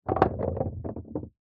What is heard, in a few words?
wood small heavy dense piece roll on wooden floor; foley